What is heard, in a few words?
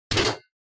Spotlight